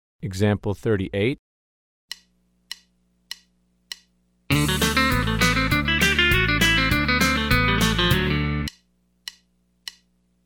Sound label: Country Rock